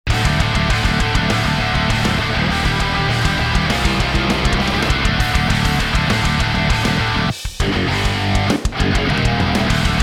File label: riff two with band